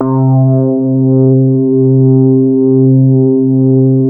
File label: MOOG BASS C4; Best Service Dream Experience